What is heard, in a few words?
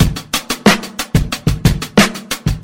Drum loops, Boombap